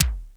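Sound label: electronic drums; Crypton - DrumBase5000; ELEC.DRUMS#3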